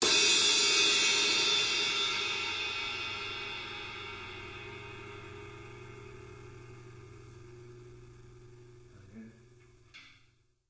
Modern Crash, DrumKit; REAL LIVE KITS; Osama Qureshi DrumKit

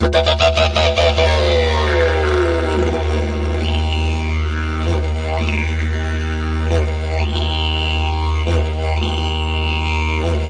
barking oscillating didgeridoo; World Music

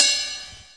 CYMBAL, Electronic Jazz Kit; STYLE KITS (Hip Hop, Etc)

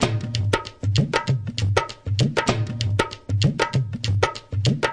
looper, drum loops and breaks, 97 bpm

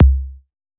gliss down, Kicks; single hits

